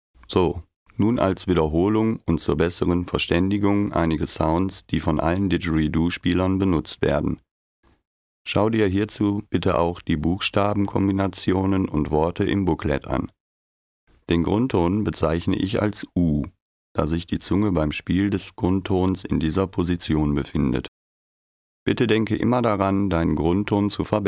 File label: Basis Sounds